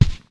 footstep

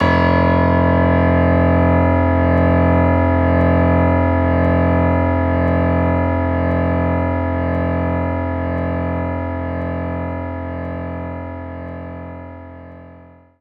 Grand Piano F1; Knights Try

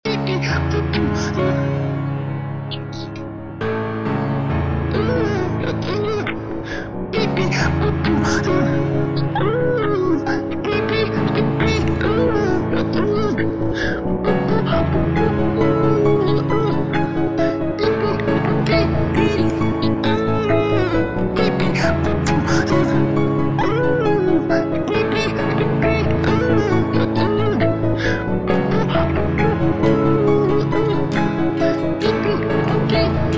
Acoustic; New Scuffed Epic Soundtrack Vol. 2

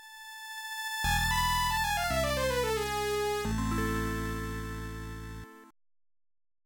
Music - Jingle; fushigi no umi no nadia the secret of blue water; pc engine cd; turbografx 16

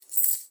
Coins

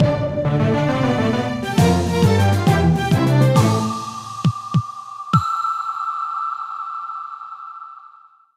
Leave Port Fanfare; Tutorial; Steel Diver

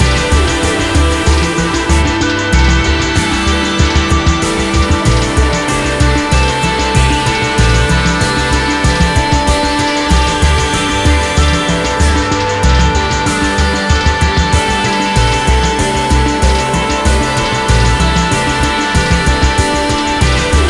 Win; forza street

